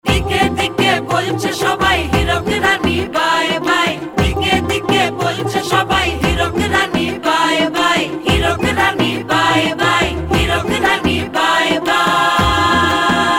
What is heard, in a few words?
ringtone